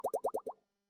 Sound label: bubble